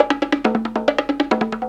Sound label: Fuzz Percussion Bongo; Fuzz Townshend